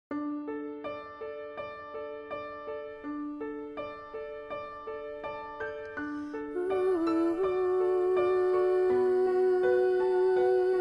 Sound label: alto